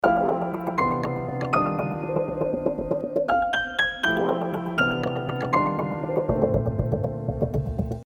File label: Loop Comp No Spectral; SmartComp